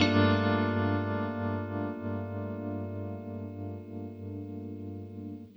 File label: Hip Hop and R&B Drumloops